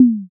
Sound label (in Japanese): tsuzumi